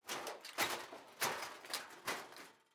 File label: ambient; World of Tanks